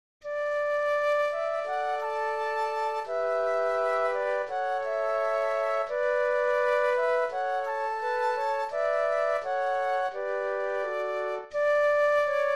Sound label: flute trio